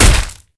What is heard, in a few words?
blunt hit; matmos interactions